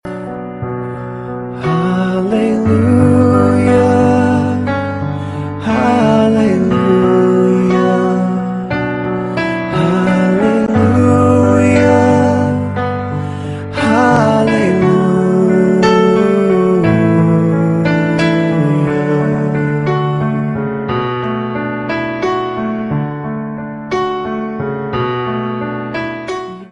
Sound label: song ringtone